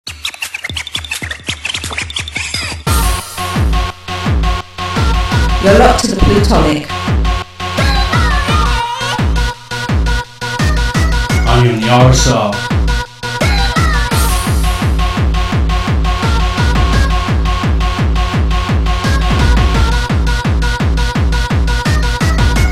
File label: happy hardcore jingle; OldSkool